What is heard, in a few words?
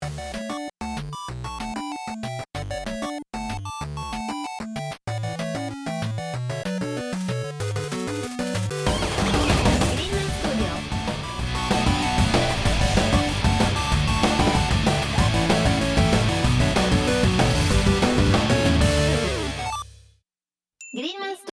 8bit rock